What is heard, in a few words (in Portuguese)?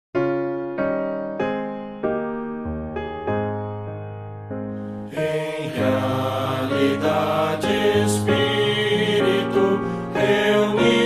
voz piano